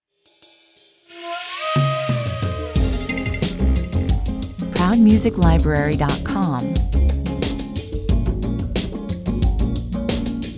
90 bpm, Fun, confident, contemporary track incorporating jazzy elements; Fun, confident, contemporary track incorporating jazzy elements carrying and overall optimistic up beat vibe with feisty flutes, Rhodes